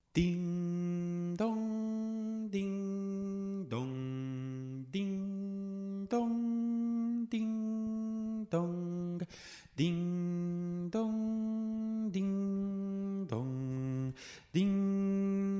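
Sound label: campana alto; tradicional; Campana sobre campana; (arr. Jesús Alonso); Navidad JOCSMAB 2023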